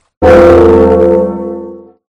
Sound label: fail loud